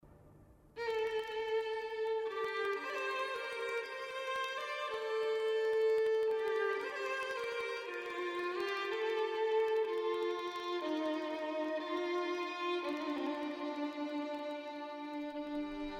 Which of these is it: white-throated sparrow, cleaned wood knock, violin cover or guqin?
violin cover